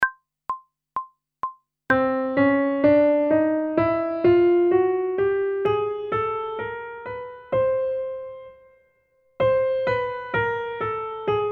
Chromatic Scale one octave ascending and descending, pop vocals; grade 6; Technical Work